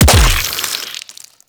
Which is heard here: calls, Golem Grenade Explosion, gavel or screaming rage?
Golem Grenade Explosion